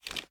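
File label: pistol reload extended mag start; gmod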